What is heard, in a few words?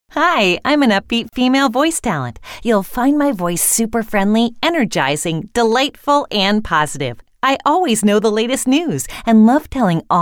Upbeat